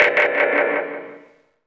Tauk, One Shots Hit; Sonic Foundry (Sony Creative Software) - Future Retro Dance Excursions

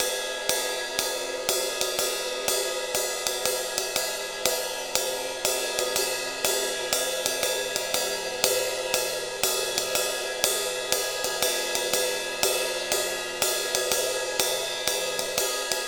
Ride Cymbal Pattern

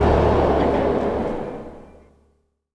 NOISE, Production Effects

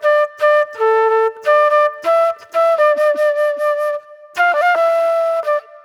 Classic Soul Flute

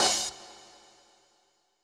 CYM, DRUMSAMPLS, Disco Fever; Best Service ProSamples vol.48 - Disco Fever; AKAI

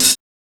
OHH; X-Static Goldmine 1

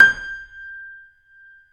YC7 piano, ff; Roland L-CD701; ff YC7 St

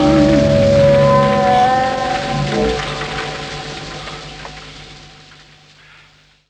Strange, Ambient; Jungle; Zero-G Jungle Warfare 1, 2 & 3; 90 s Sample CDs

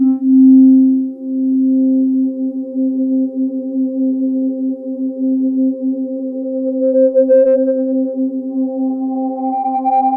SPACE DAYS; Rubber Chicken Software Sample Bank

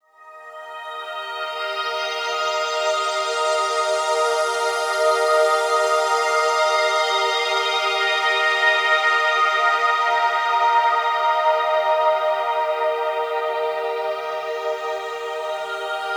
Hanging Glass Wave, Drones; Sonic Foundry (Sony Creative Software)

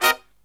LOW HIT, Brass; USB Soundscan vol.29; Killer Brass Riffs; AKAI

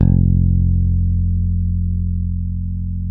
bass, sustain; Sampleheads; Will Lee Bass Library

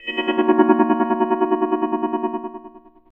CHORD, Drum & Bass, 130 BPM

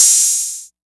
OPENHAT808, Electro Perc; E-MU Formula 4000 Series Vol. 3; Analog Odyssey

